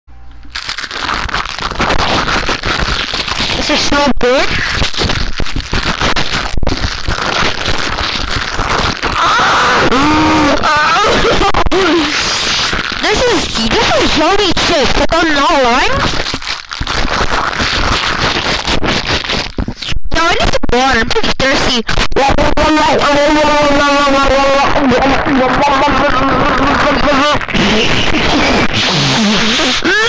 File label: Eating chips (earrape)